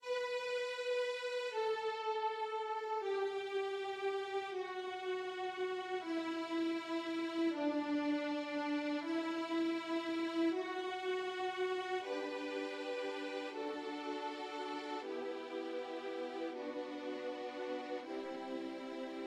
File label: simple violin, violin trio; canon for young players; pachelbel